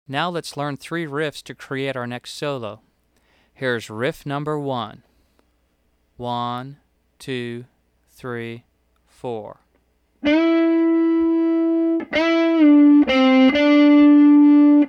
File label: Bending Riffs